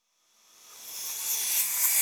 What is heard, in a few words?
Shimmer, Hiss, Retracte, Weave; Electa Quinney